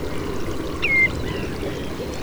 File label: crshank totanus FC; stiffkey